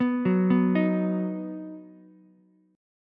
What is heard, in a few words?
Eb Minor Sharp 5th, Ukulele; Ebm#5 arpeggiated; JGuitar